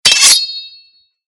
Sword Shwing

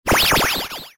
LASER

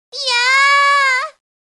iyaaa

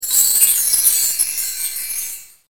BELLS

